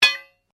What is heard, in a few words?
Blade Heavy Impact, Sword